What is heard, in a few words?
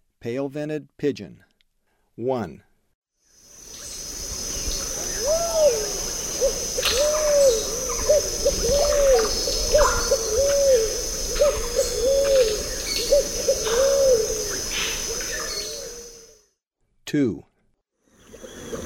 Pale-vented Pigeon; S. America; Eastern Lowlands